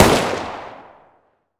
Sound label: 1911 distance fire; weapons